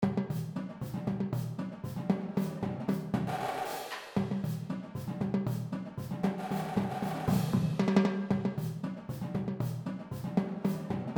drumline

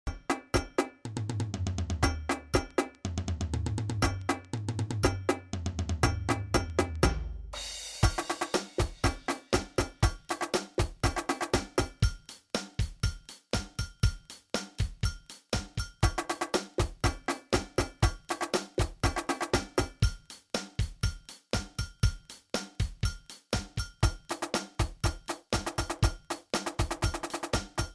drum

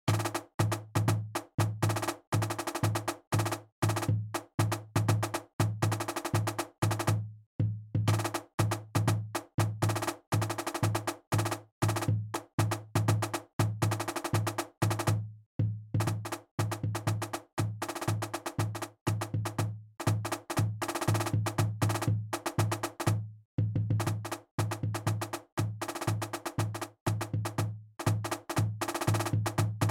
easy marching

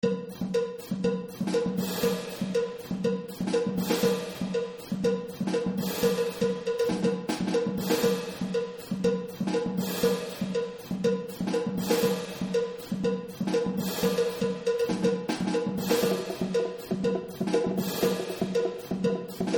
groove time

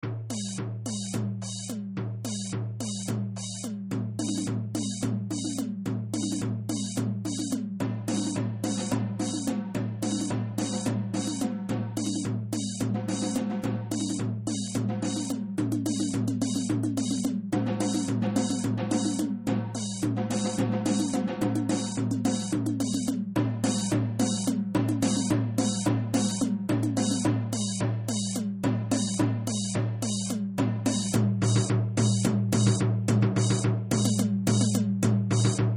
shifting 8 to 4 beat, drums

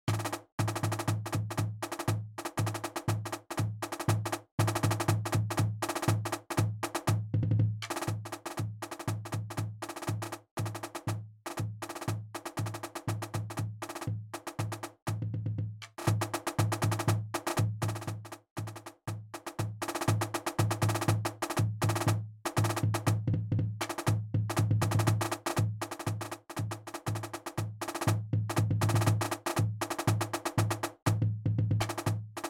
a nice groove

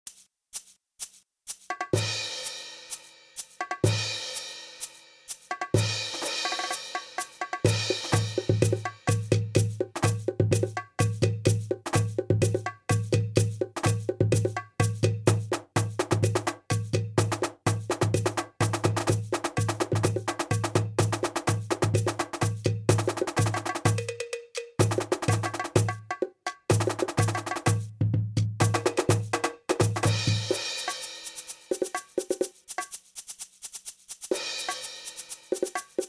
beat the rhythm

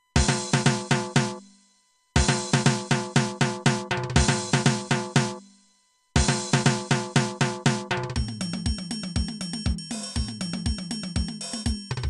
brazilian break, drums

